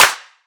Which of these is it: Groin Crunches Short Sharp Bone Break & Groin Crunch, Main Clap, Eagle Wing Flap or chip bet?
Main Clap